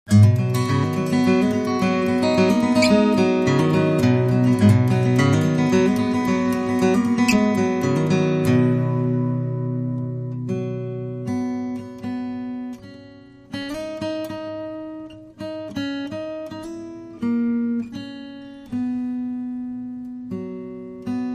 O Christmas Tree Guitar; Trad (PD)

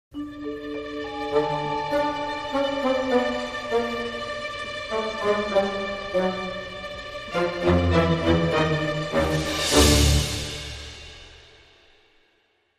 Dramedy Sting

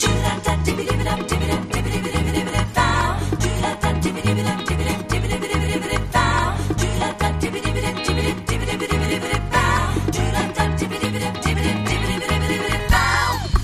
Vocal Underscore

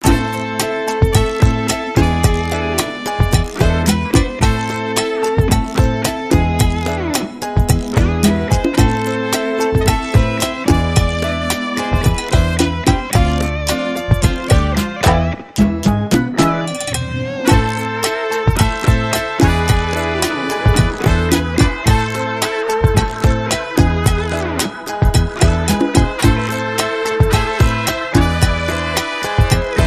Rock Cha Cha

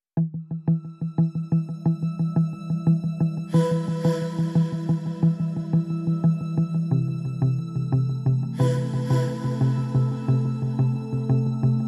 Vocals and Drone Only

